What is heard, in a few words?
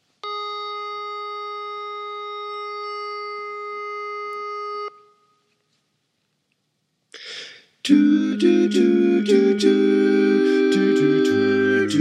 Tenor